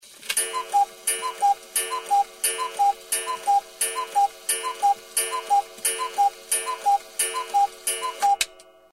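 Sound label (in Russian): chasy s kukushkoy, cuckoo clock; тиканье настенных маятниковых часов с кукушкой; Разные звуки секунд и секундной стрелки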